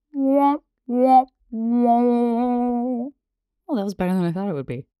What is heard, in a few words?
Trombone, sad; Foley